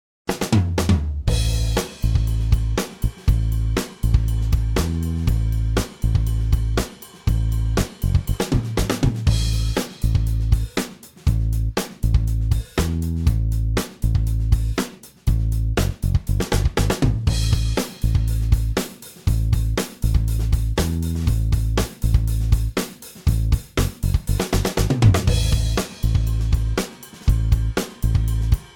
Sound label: Roots Rock Drone A120; drones